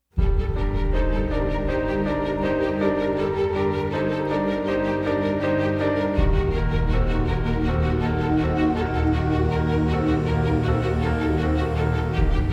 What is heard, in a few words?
no choir